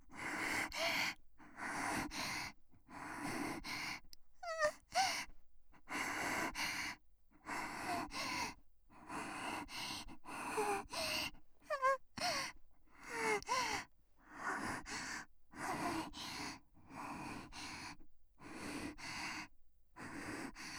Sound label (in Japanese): 喘ぎ声(極小)